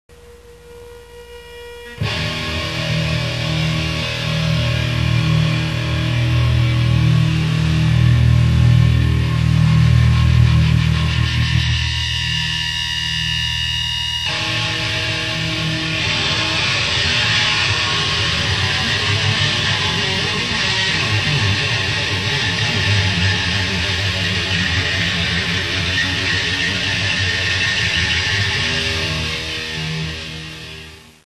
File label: Agressive Guitar; MetallHead